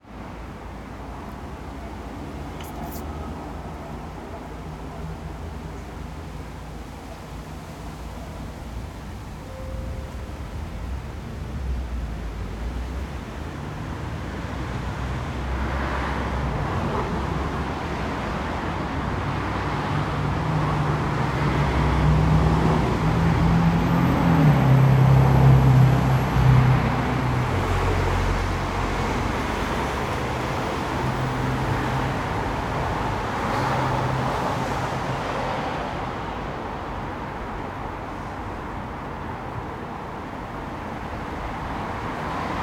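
AMBIENCE-AUSTRIA-VIENNA-EXTERIOR-DAY-OUTDOOR SUBWAY ARRIVES IN DISTANCE; Monte Sound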